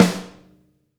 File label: SNARE, Drum Kits